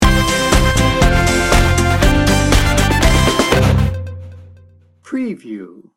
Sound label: joy and triumph sting bumper